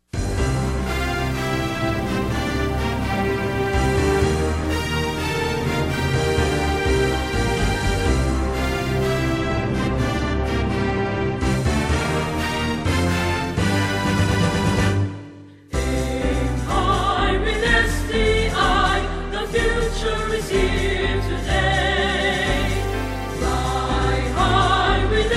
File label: alma mater hymn